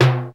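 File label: DR550 low timbale; 90 sSampleCDs; Crypton - DrumBase5000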